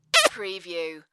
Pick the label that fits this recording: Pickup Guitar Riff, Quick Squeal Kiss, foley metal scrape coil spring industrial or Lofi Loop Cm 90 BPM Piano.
Quick Squeal Kiss